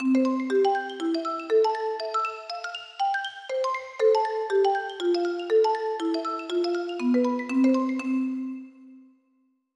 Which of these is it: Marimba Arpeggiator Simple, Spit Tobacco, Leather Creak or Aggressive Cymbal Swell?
Marimba Arpeggiator Simple